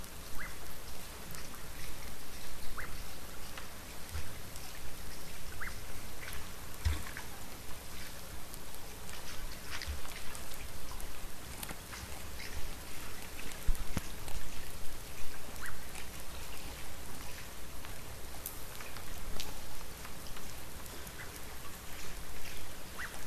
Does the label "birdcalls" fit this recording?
Yes